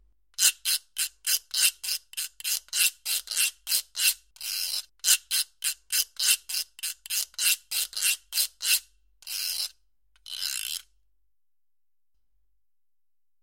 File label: Guiro